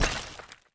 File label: ice block smash; frozen rush